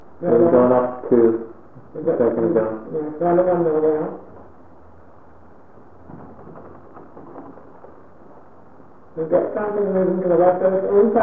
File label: running; what sounds like a child running VERY fast through the church; Olympus Digital VN-1800; Running #2; Lost Church